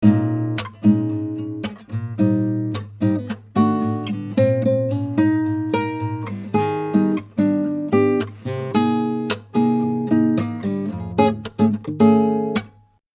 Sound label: acc git dry